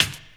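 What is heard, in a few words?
snare drum, Drum & Bass; Ueberschall; Drum & Bass Flash Trackz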